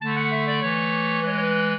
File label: clarinet; Mozart